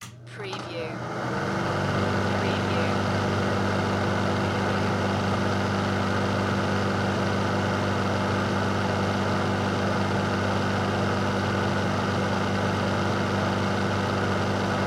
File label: Bathroom Fan On Run; sound effects bible